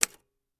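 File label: Typewriter Single Key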